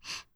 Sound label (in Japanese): ブレスショート